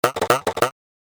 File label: Cartoon Boing